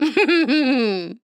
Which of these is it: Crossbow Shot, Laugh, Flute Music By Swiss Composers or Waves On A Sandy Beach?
Laugh